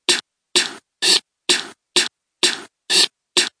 hatlike loop dry; Ze Franks Pain Pack

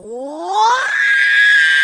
choked diva gliss

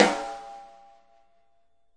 radio king snare; single hits

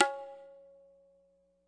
classic hip hop Tab; STYLE KITS (Hip Hop, Etc); Classic Hip Hop Mega Kit

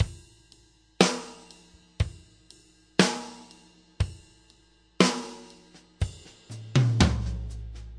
super laidback, drum loops and breaks, 060 bpm; 000 to 080 bpm

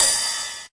crunchy kit crash; STYLE KITS (Hip Hop, Etc)

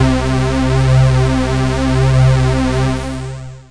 string 80s; Pads

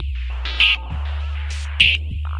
nothing but noise, drum loops and breaks, 100 bpm; 091 to 100 bpm

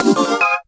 sequence 5ths up; SYNTH AND ELECTRONIC

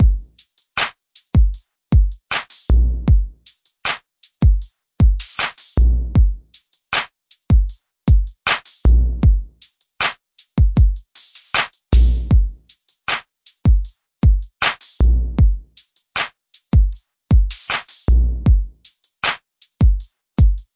gamelan bass groove drums only; 078 gamelon bass groove

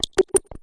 idm pulses, drum loops and breaks, 100 bpm; 091 to 100 bpm

